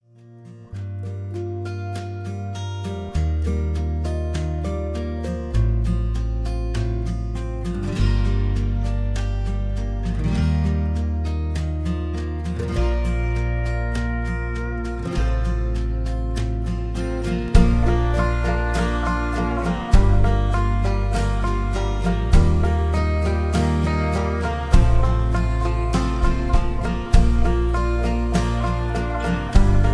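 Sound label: Karaoke Backing Track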